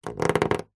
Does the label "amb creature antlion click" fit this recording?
No